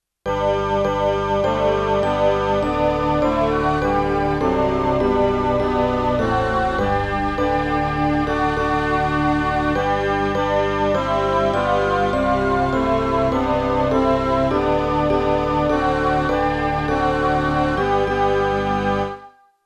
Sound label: Joyful joyful we adore thee